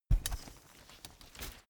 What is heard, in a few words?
umbrella big close full